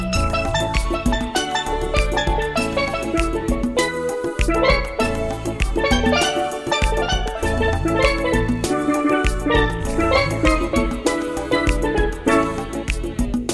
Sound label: Sreggae